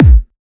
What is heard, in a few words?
Kick; dnb beat ableton